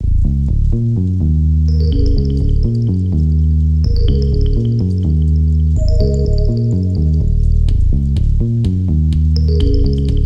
a raindrop gentle, song

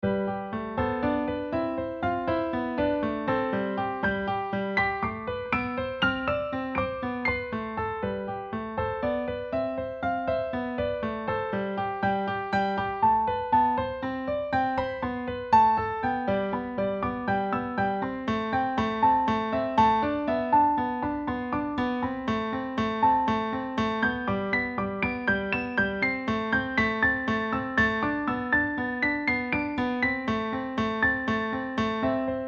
piano

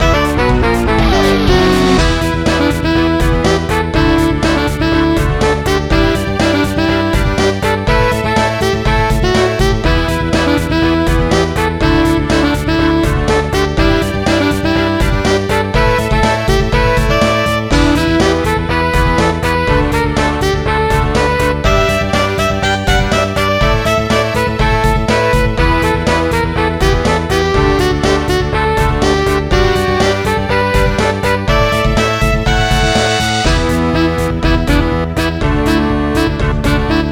beach; triple bubble music; world 2

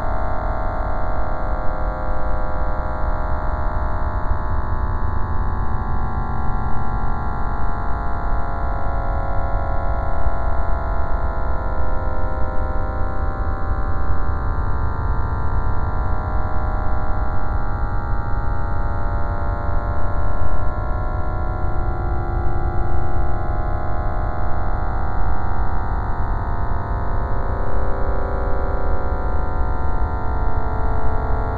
Lucky Little Spooky Ambience

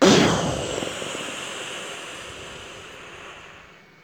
Explosion

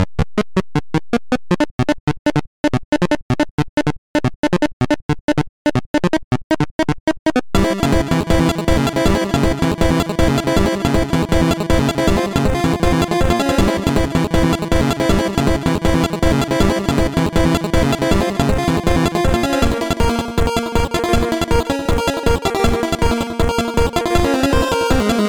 boss, platformer chiptunes

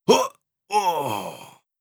death groan male

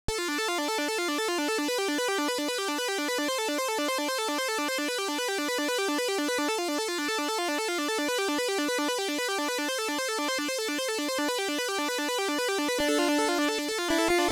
level up theme

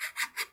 pencil scribble, writing scribbles

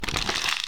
paper crushed

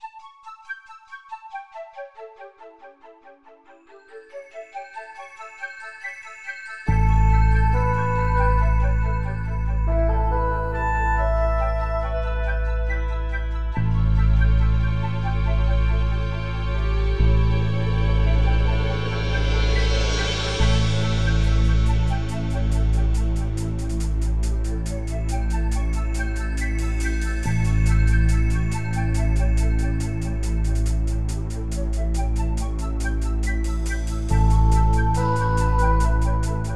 Loopable

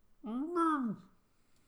moan

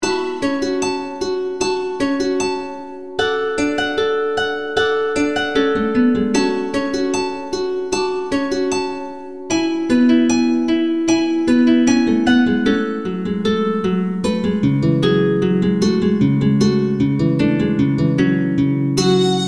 predynastic dawn accoustic loop

